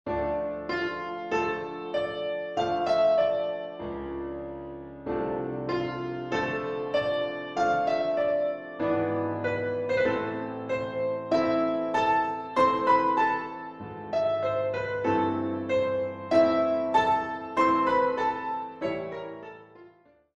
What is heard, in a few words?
Theme Duet version